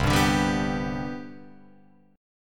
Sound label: C Major 7th Suspended 2nd C down Guitar Open F, chord; CM7sus2 strummed; JGuitar